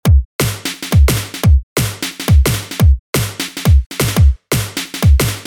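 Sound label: Drum Writing Examples drum and bass 2nd SNARE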